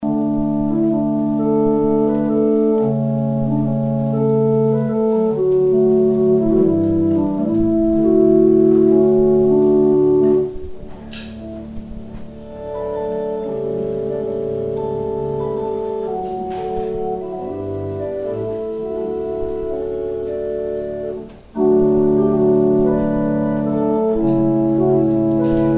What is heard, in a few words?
organ interlude